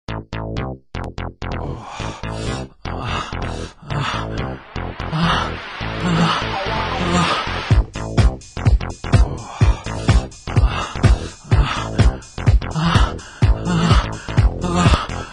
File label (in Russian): Мужские стоны